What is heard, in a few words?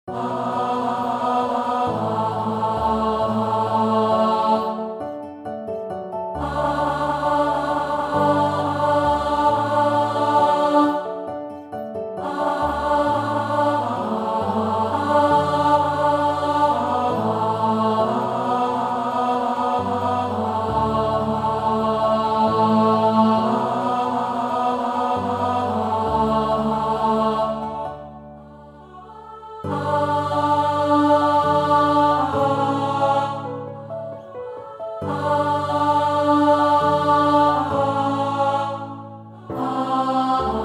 Alto